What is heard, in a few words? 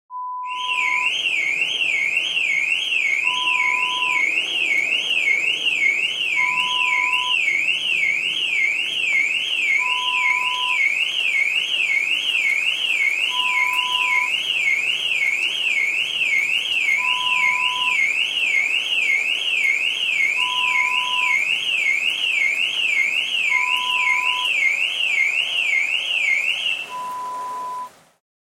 Car Alarm sound